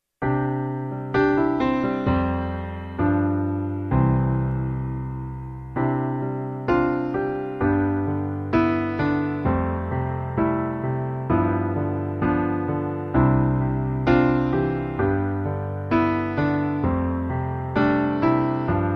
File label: melodica